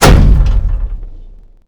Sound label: avre shot churchill; WAR